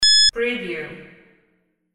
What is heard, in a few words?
beep